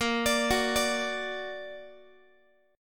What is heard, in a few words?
Bb Augmented Bb Guitar; arpeggiated; x,18,17,16,18,x